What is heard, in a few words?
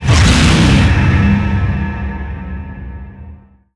shield cast; weapons; dota; abaddon